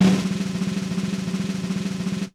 RM1X Drum Anlg Kit Snare Roll; Yamaha RM1X

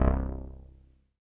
505, String Machines

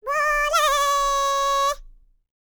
BULGARIAN, World Voices, BALKAN

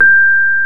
PNO, Rhodes Mk.V; Keyboards of The 60's and 70's

